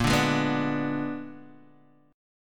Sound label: Bb 7th Flat 9th Bb, Guitar Standard; Bb7b9; strummed; 6,5,6,4,3,x; JGuitar